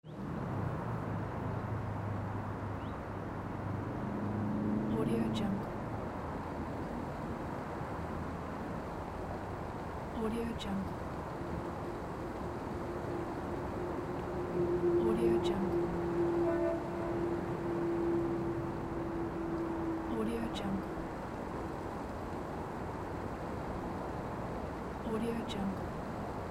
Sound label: suburban neighborhood ambience; danielmix